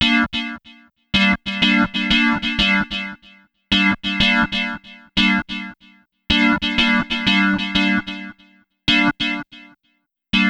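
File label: East Coast Hip Hop Delayed Synth Gm; Digidesign; Pro Tools; Pro Tools Essential Demos; Essential Loop Demos; Demo Session - Hip Hop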